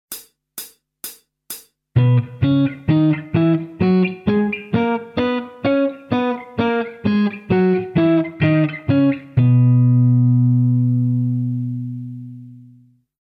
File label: octaves